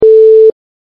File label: notify